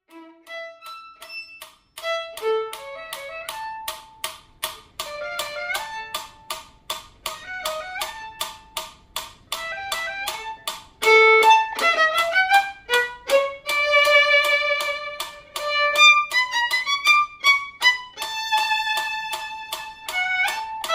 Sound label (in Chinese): 第四號學生協奏曲 第三樂章 小提琴 示範演奏; 賽滋; 新版 篠崎小提琴教本 第三冊